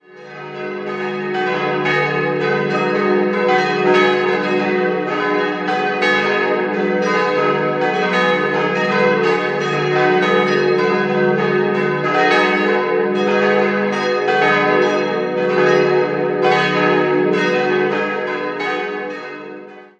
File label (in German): glocken; Stettfurt